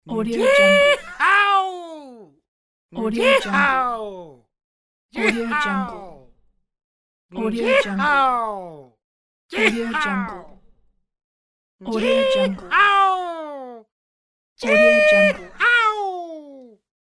cartoon yeehaw

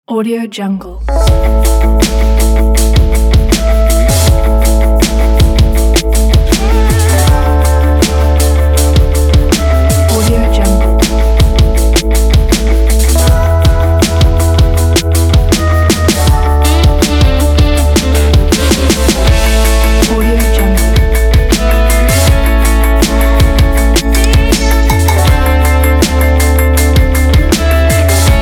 rock n roll beat

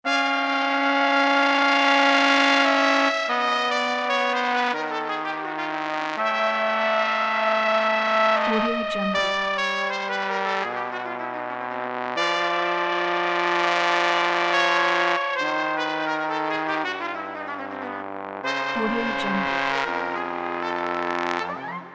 evil witches ident

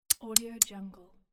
tsk sound

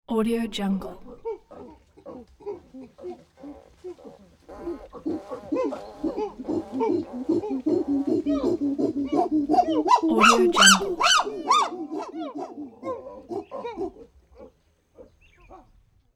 group of excited chimpanzees; sound ideas

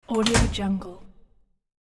door front close medium; glen oaks; hollywoodedge

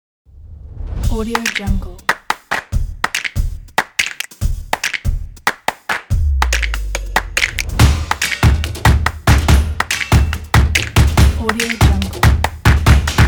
claps and stomps logo pack

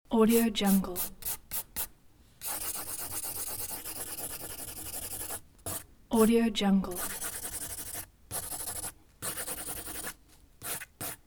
pen drawing

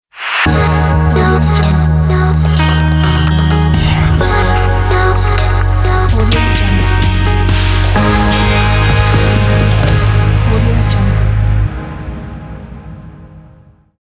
atmospheric logo; svyats

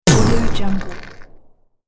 giant footstep

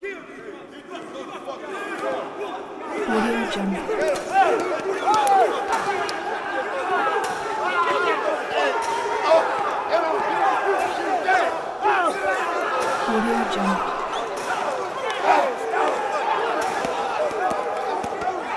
prison crowd male yelling chanting angry; prosoundfx

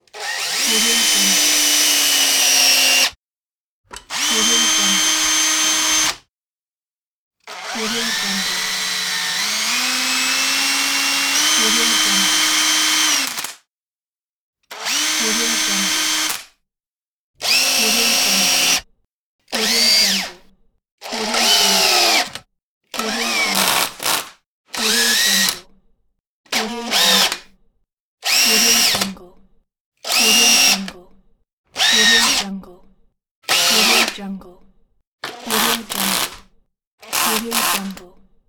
cordless drill

